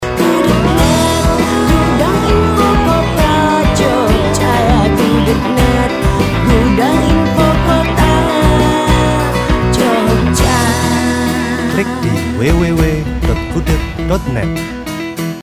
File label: jingle; gudegnet